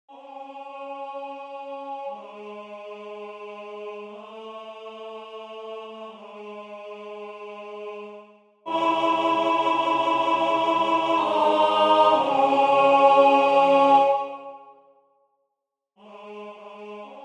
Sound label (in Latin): Ecce Homo tenore